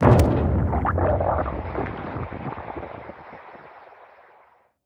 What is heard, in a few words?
explosion; MHAMMERHEAD